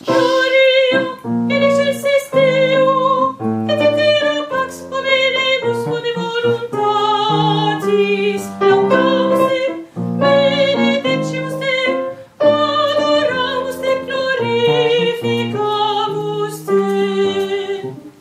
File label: gloria sopran